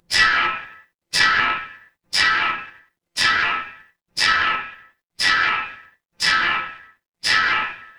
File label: fire electric zap